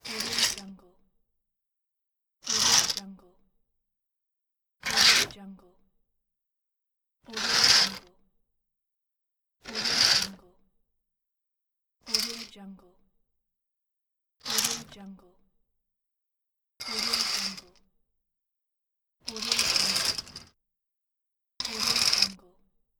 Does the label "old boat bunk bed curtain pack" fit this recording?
Yes